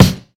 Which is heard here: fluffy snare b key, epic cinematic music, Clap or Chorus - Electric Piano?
fluffy snare b key